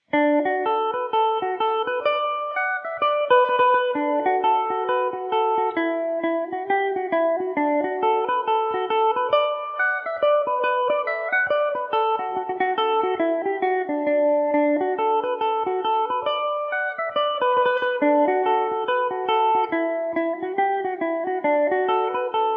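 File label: D major mandobird, irish mandolin; devanneys goat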